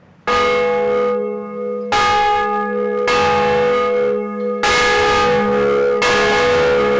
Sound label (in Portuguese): Sinos